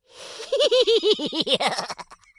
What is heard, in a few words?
laugh